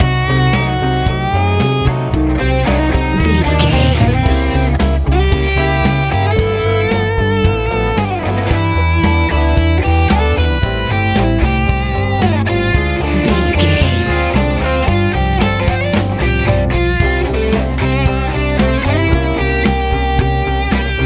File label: sunny big good airplane ride life easy pop